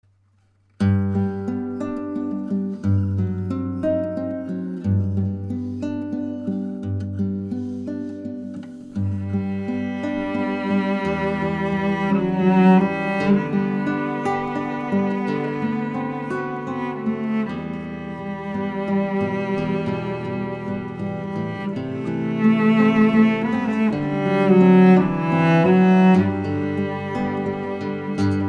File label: ave maria instr